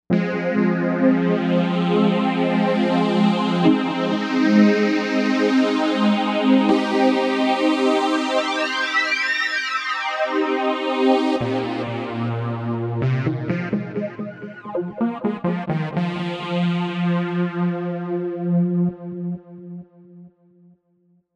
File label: Mega Phase Saw, Novation UltraNova; Factory Patches